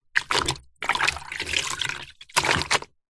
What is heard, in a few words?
household mop wet movements plastic bucket; zapsplat